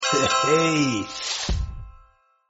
bonus note appears; dealer; maitre d

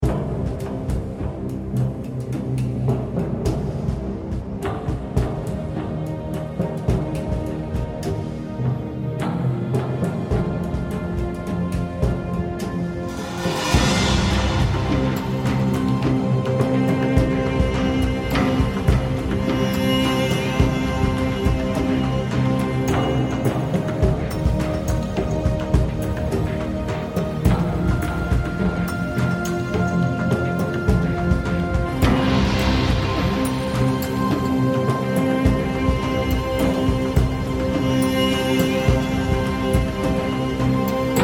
bonus level; Recode; jewel Quest Riches

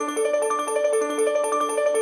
Base Tally Loop; Romanov Riches

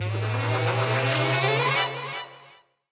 respin start; riviera Star Desktop; Fantasma

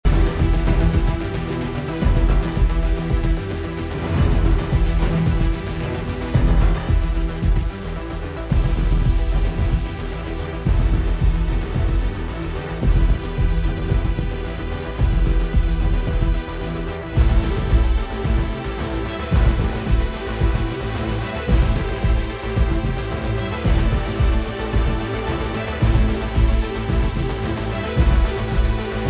freespin ambient; Rabcat; Classic 243

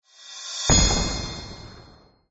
general bonus multiplier; common bonus

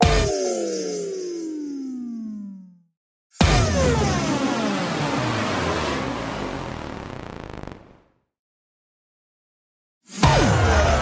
Win Sounds Big Win; holly Jolly Penguins; Mahi Gaming

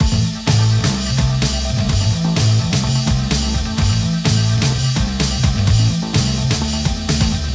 Win Summary loop; Romanov Riches

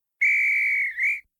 Symbol Bonus Animation; genesis; euro Golden Cup Desktop

